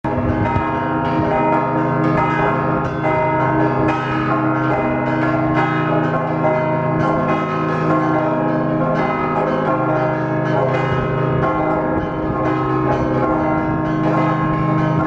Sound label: Mellieha Bells